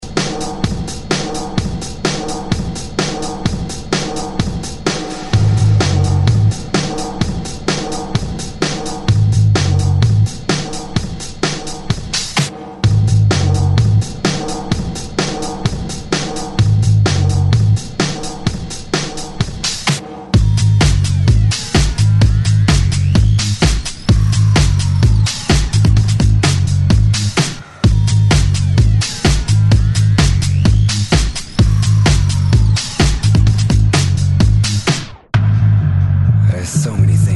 Rock ReDrum 128 Bpm; DJDOLLS

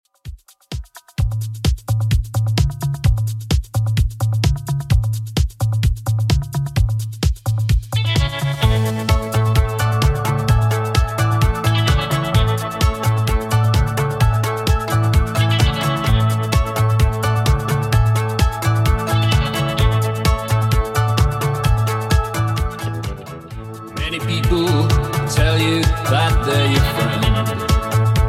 80s Redrum 129bpm

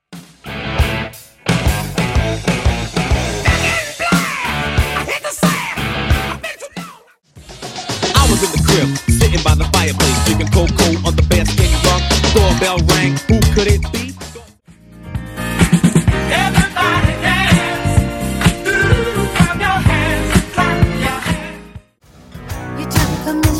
70s 80s 90s Funk Dj Intro Outro Tracks

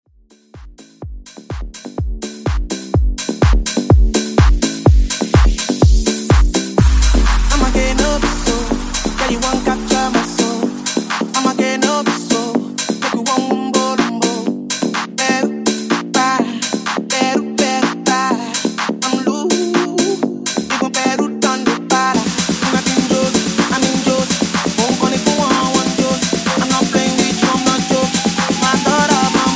Top40 Tech House; Mashup

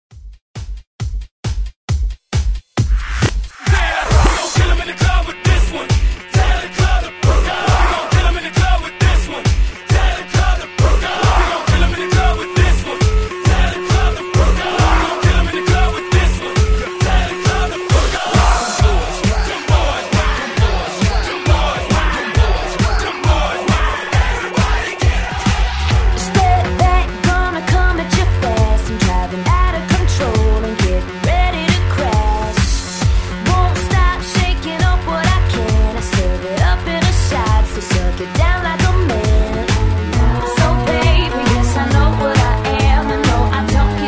Hype Re Drum 135Bpm; DJDOLLS; remixplanet

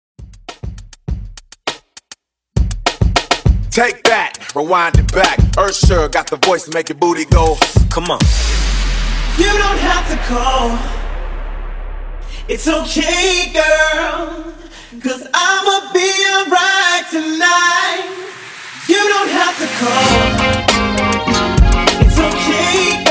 Quick Hype Intro Epic 101 BPM

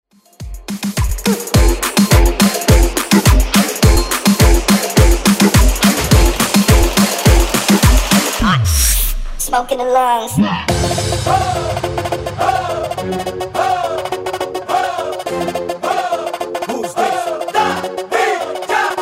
Moombah Twerk

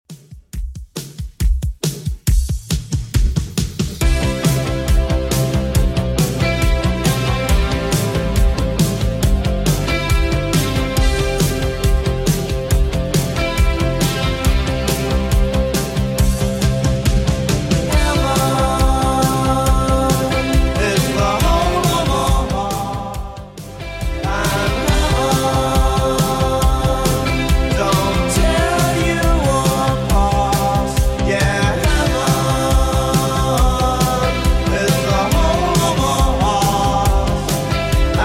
80s Redrum 138bpm

